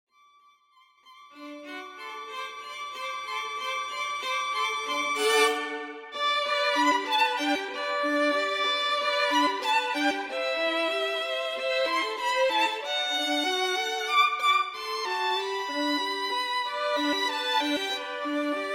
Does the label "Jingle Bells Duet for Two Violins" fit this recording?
Yes